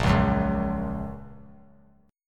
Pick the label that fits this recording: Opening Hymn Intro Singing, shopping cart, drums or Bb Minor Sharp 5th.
Bb Minor Sharp 5th